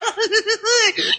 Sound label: laugh cartoon